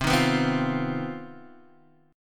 C Minor Major 7th Double Flat 5th chord, Guitar Half Step Down